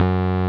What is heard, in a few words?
Roxichord, E.Piano; Roland LCDP10 Keys of the 60s and 70s 2